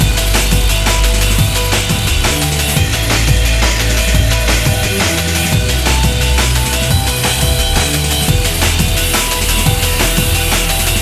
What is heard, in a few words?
electro freek loop; shakesoda